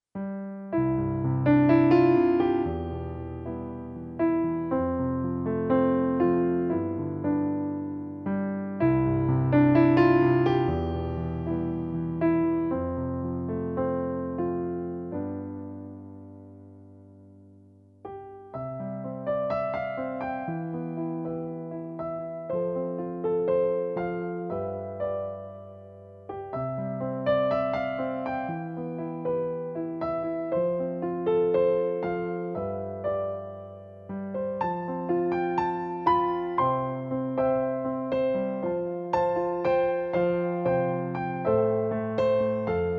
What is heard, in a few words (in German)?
Playback, C-Dur